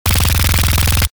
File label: Special forces submachine gun suppressed burst